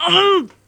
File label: pain; arachna